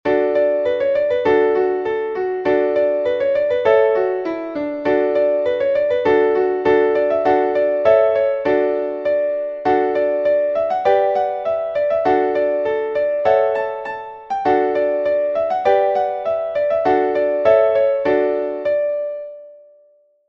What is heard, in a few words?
Polka Irlande, musique traditionnelle; John Ryans